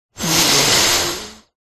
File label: human male breath in through nose stuffy